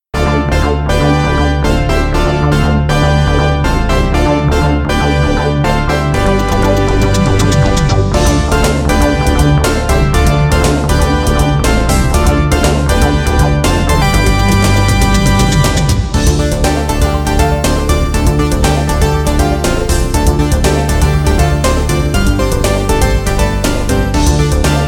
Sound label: MUS Result screen; No2 Pinball Party